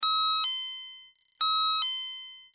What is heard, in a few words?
gong